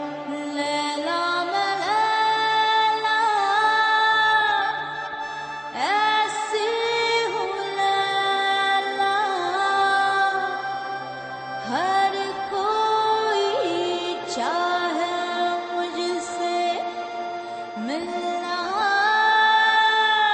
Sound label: Slow